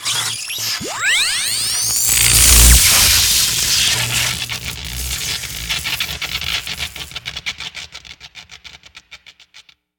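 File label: lightning; Cosmic Rage; ships combat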